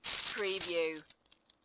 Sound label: Leaves Rustling